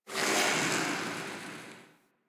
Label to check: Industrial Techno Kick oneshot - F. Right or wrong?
Wrong